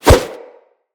Brawl Whoosh; Characters; Brawling

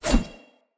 Short Sword Whoosh; Weapons; Swords; Sollos

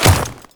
Royal Guard Ice Step, footsteps; Enemies; Warframe; Grineer Royal Guard

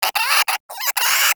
Cryptilex Vocal Alerted; Characters; Warframe; Hunting Critters

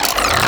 Reload, Weapons; Sapient Primary Reload A; Warframe

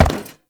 Step Perforated Metal; Warframe; Uriel

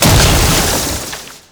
Stalker Jade Scythe Proj Die; Warframe; Weapons

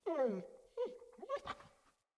Pack Wolf Idle Grunt; Animals

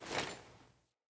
Foley Action Default Sword Throw

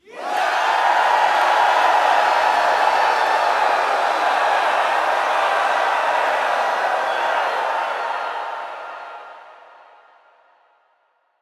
Heavy Cheer, Crowd; Lunaro

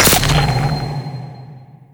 Maw Fishing Fish Impact; Warframe; Duviri

